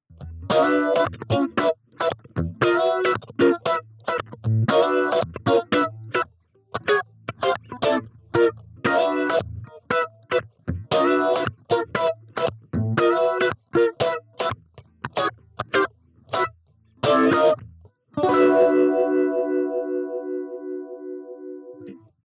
US Combo Phaser; DUAL CUBE

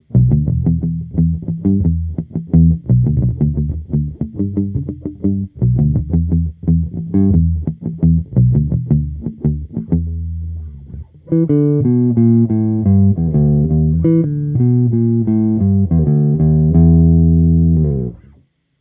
Block 300B EQ Hicut Cab

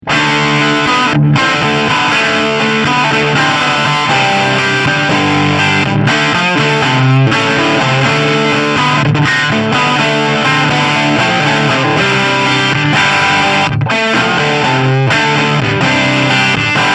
Pentode Full

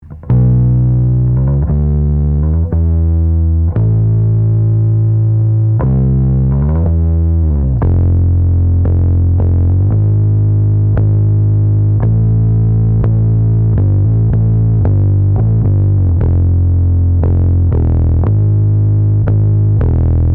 WA76 Hoefner Bass Ampeg U87

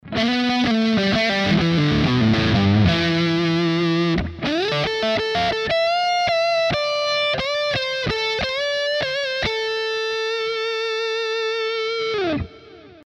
Epiphone Custom Les Paul Neck lead

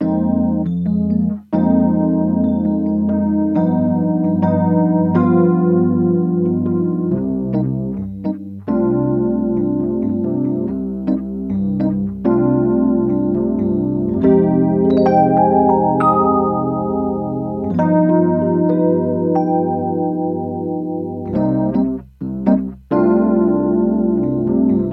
Electric Piano Harley Benton Classic Chorus

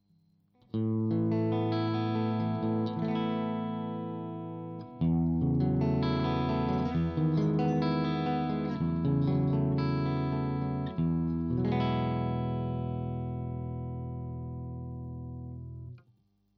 clean Position 3 KSM313 front

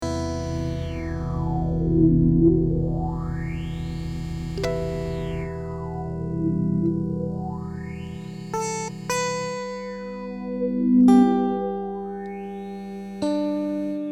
synth A guit Dimension

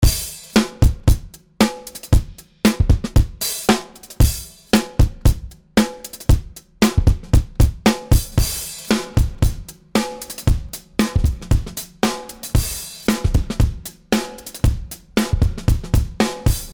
Acoustic Eisen

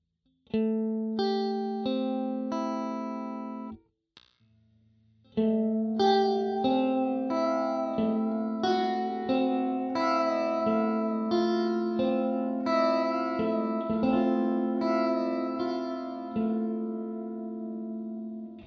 LFO

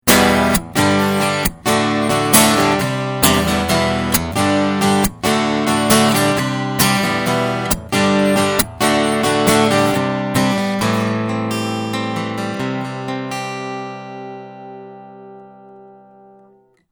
Takamine 360

